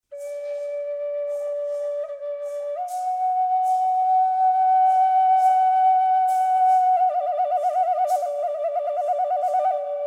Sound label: FLUTE 2 phrases